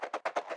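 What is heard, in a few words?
wolf; El Rey Arturo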